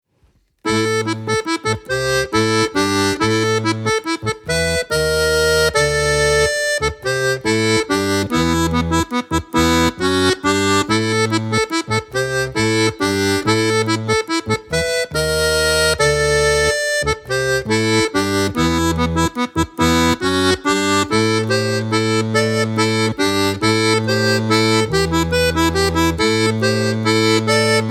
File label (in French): Rythmique